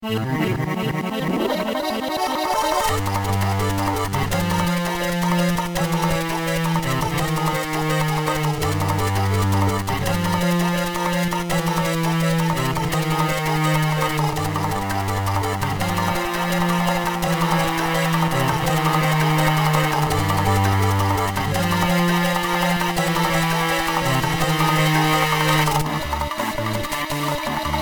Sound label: Mini-Game Theme; MPA